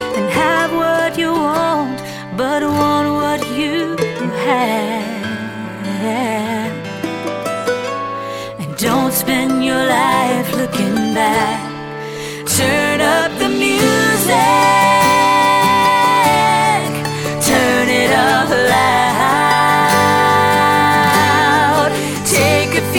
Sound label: Acoustic